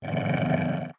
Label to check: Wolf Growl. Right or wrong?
Right